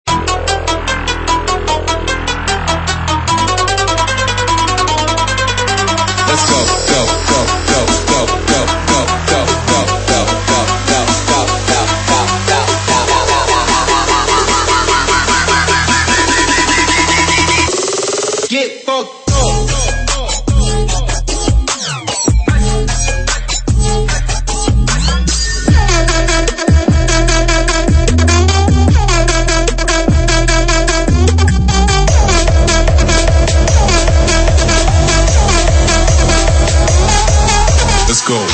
This Is Bass!; Noiiz Davoodi

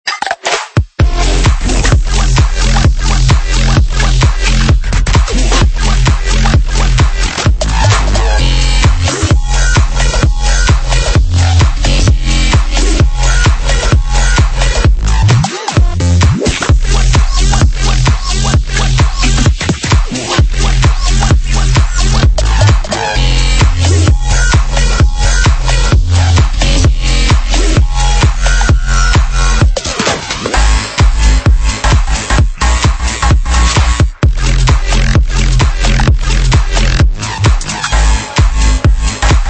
bass house; SERUM PRESETS; Resonate for Serum

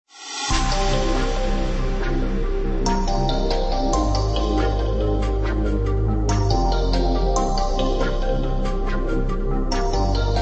Chill Trap; Wave Essentials And Chill Trap; Serum Presets

